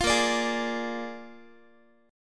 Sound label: A sharp 7th A sharp, Guitar; A#7; strummed; 20,18,17,18,x,x